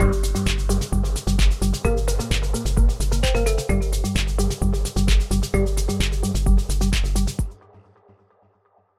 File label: techhouse